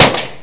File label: GUN; Katabounga 3.0; French